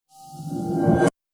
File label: Horror Abrupt Riser; Scary Soundscape Sound Effects